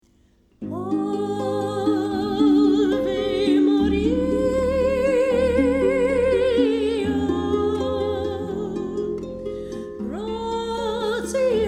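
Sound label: ave maria with vocal, harp; schubert; wedding music for harp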